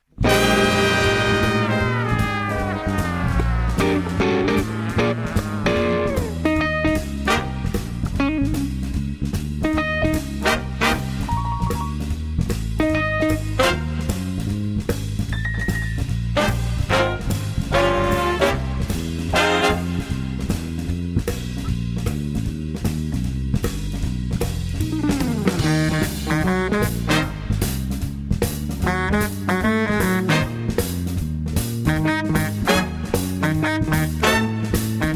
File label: bellbird blues